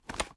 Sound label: reload empty fast shake; reloads; gmod